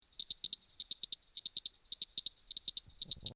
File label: leptolalax applebyi